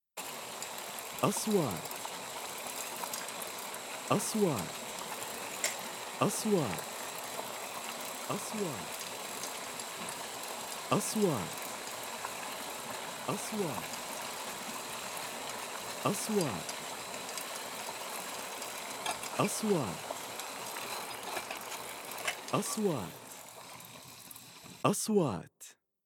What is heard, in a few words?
Fry Coffee Tea; kitchen